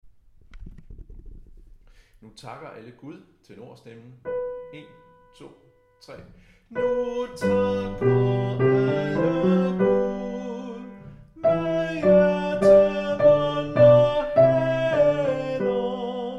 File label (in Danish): tenor; Nu takker alle Gud